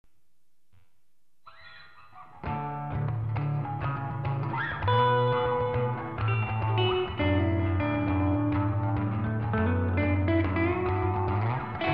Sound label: Guitar